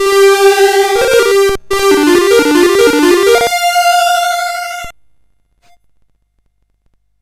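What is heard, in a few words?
Operation Hormuz, MOS 8580 SID chip music, games; CSG8580R5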